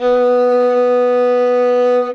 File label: Violin Bit